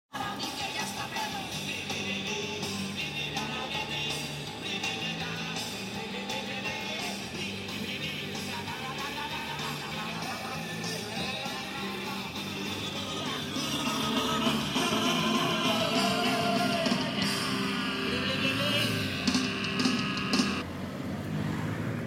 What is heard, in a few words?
taxi music